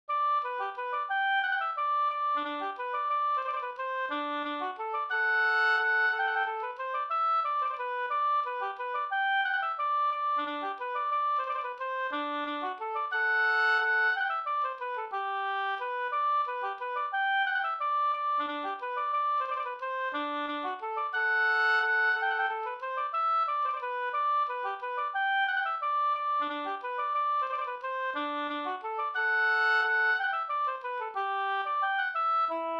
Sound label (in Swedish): vals springvals; Bohlins springvals Up